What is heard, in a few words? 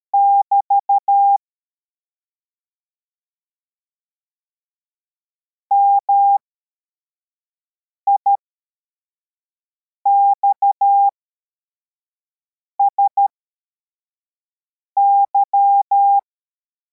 cw, morse